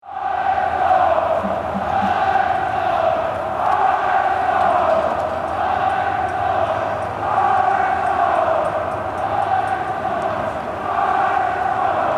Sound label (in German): Fussball vorm Stadion